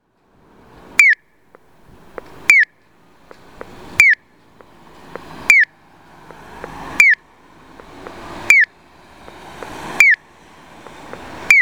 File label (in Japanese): piyo; tokai; yokosukamachi; onkyo kosaten; aichi